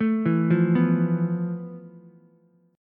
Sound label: F Major 7th Flat 5th Ukulele; arpeggiated; JGuitar